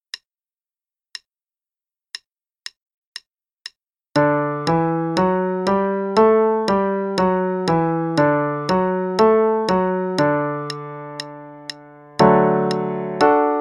D Major (qn=120); Level 1 - 2nd Ed

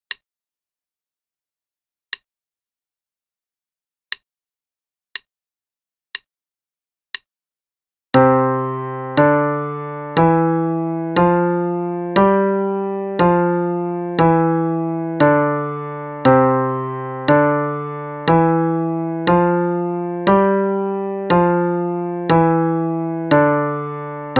5 Finger Pattern in C, qn=60, piano

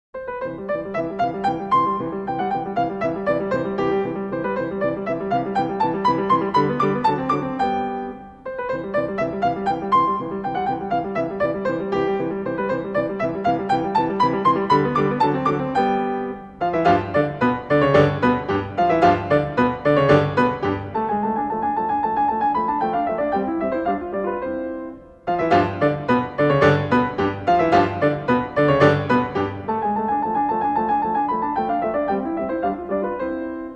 Country Dance In C Major; Magrath Book 04

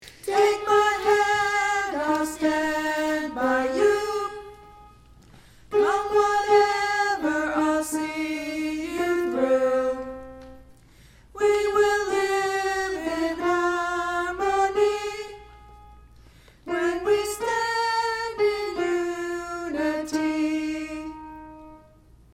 alto

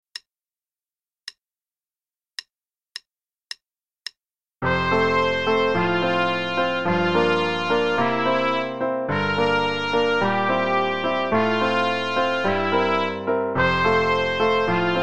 Play-a-long, in F, both parts, qn=120; Apollo; trumpet

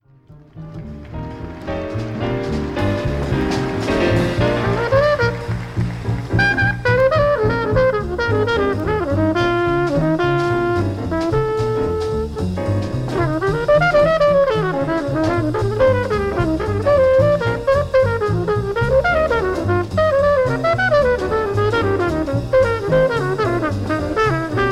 Blues Solo, jazz trumpet; solo only

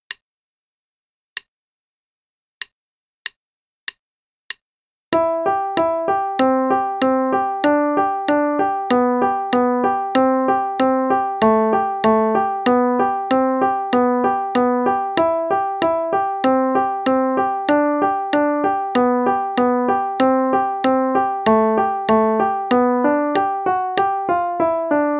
Frolicking, LH, qn=96; Berens

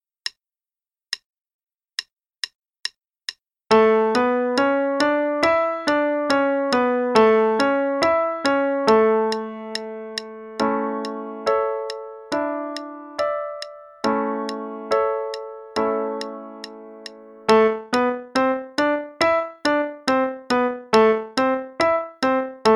Warm-up in A, Major then minor, qn=140; minors are staccaco; Faber; Level 2A, 2nd Ed p.63 - A Major, then a minor

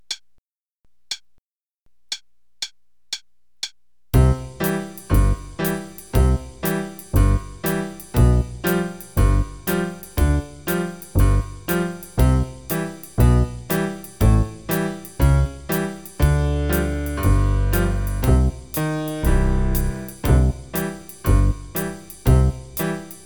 bass and drums, qn=120; Faber; Level 0

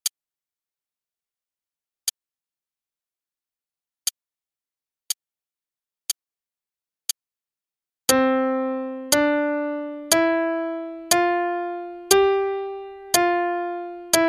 C Pentascale Warm-up (qn=60); Faber Adult All-in-One; Adult All-in-One Book 1

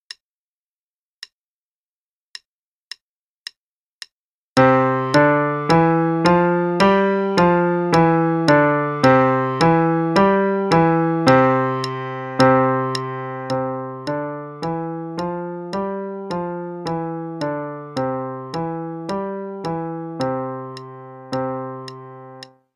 C 5 Finger Scale Warm-Up (qn=108); Faber and Faber; Level 1 2nd Edition - page 5

